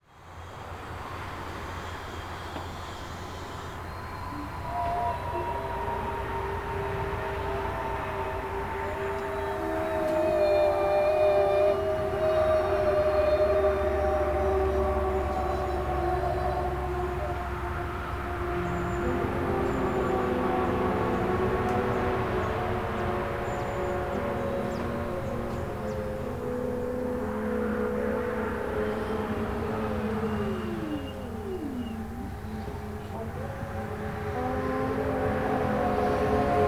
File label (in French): CHORALE ECOLE; LAPLEAU